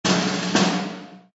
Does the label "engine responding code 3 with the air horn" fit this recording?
No